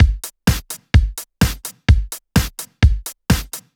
House Beat, 128 BPM

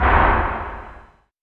FX Impact; Vengeance Samples

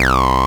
Acid Sounds C, Synths; Vengeance Samples

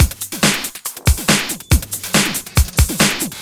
Loops BB 140, BreakBeats; Vengeance Samples

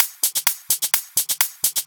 Electro Loop 128 BPM; Vengeance Samples

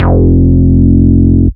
Root F, Bass

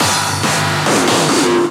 Fill 140 BPM

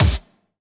Kick, Trap

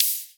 Open Hihat; Vengeance Samples; VEE Cymbals

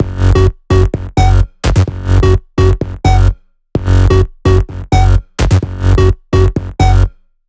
Melody 5, 128BPM; Shakin Melody 5

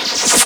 FX Scratching; Vengeance Samples

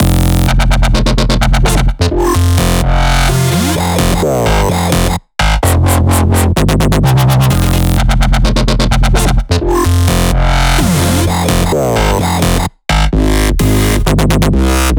128BPM Melody Root F; Spring; Vengeance Samples; Spring Kit

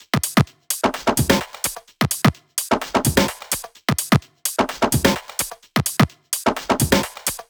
128BPM Tribal Kiss Kit

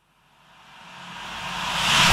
reverse, FX; Vengeance Samples

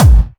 Kick; Candy Guy

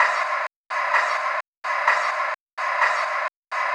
VFX2 Loop 128 BPM

